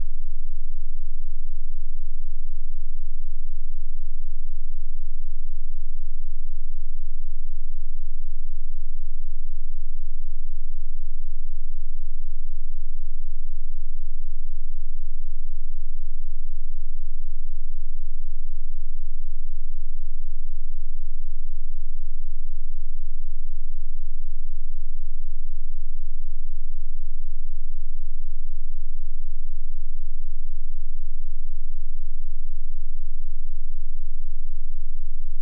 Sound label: 12hz; earth resonance; tesla